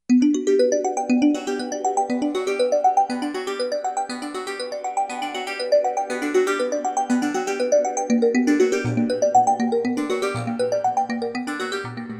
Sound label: Arp